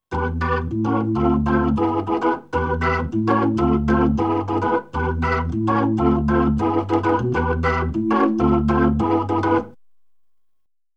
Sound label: B3 Organ; Masterbits CLIMAX 8